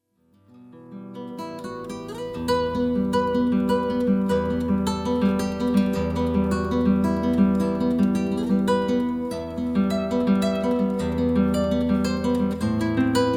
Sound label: guitarist; Romance